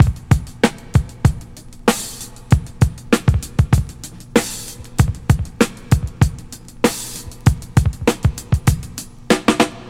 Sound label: 97 bpm drum beat A sharp key; drum loops